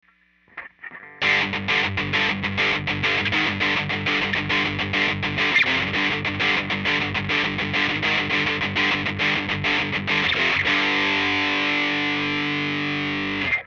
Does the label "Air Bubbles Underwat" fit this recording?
No